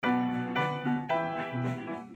Piano

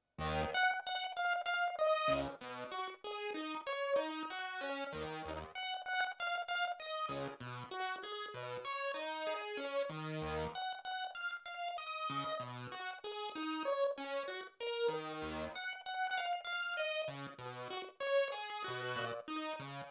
Synth Intro Main Riff

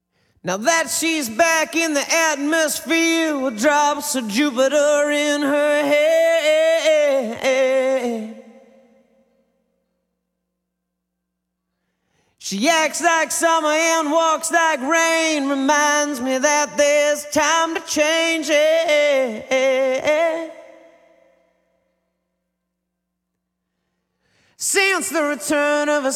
vocals

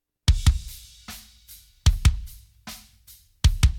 Kick Drum; Multitrack

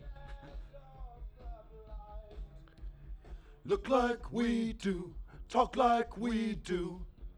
Vocal Prechorus Backing

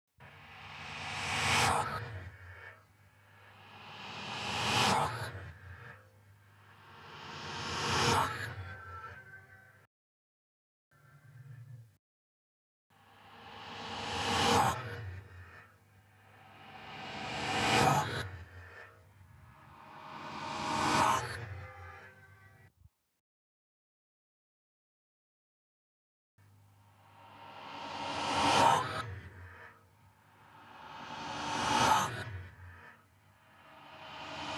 Rev Echo

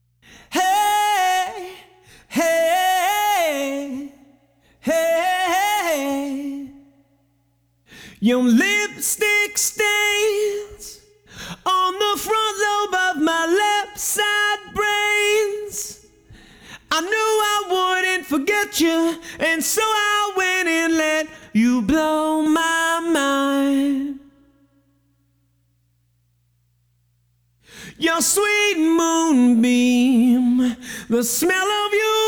Acapella

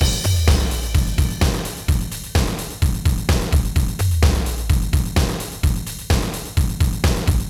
drum loop

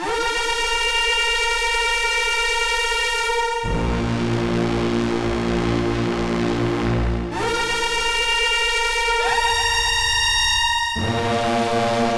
Big String

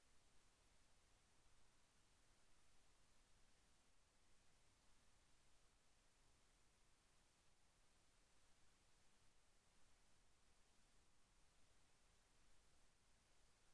guitar

